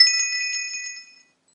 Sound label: bells; iphone 8